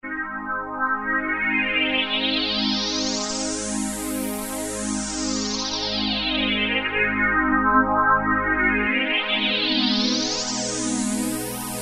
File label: Syn Rise, Roland MKS-50; Factory examples